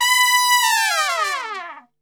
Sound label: B 3, Brass Super Section, TRP; TRP LNGFLL; Best Service